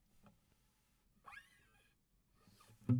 GTR

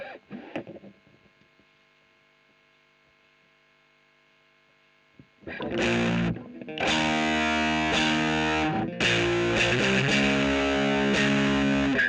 E.GTR Royer

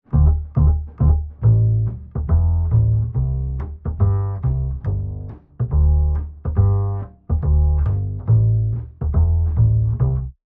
Bass, Jazz for piano

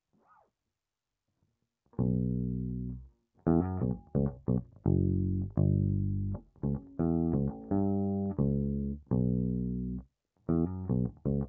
Bass DI

